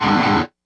guitar stab, Dmaj; Big Fish Audio; Hardcore Breakbeat Action; Get Up

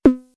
snare, DL-909

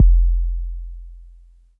Kicks, Elektron MachineDrum SPS1 MKII

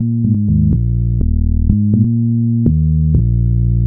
Synth Ultra Bass

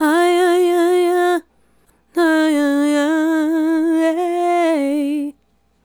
Female Solo, Hip-Hop and RnB Vocals

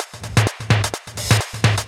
Loops 128BPM, Drumloops

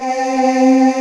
VOX, OLD SYNTHS; Rubber Chicken Software Sample Bank

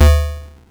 Crushed Perc, bitcrushed; musicradar

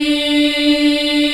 D3 child, Choir; CHILD IHF

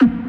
Stab; neuro; InsideInfo

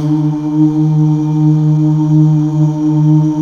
Mal Uuh, CH BACK; Best Service Gigapack 1

